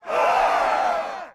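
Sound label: crowd cheer up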